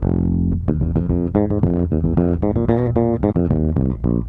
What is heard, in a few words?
Raw Funk Bass; Sonic Foundry (Sony Creative Software); What it Is! 70s Analog Funk